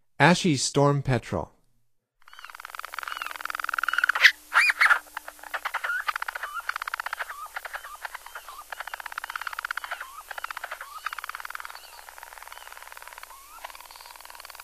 Ashy Storm-Petrel, Songs of Birds of North America; Stokes